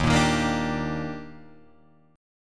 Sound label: Eb Major 7th, Guitar Drop D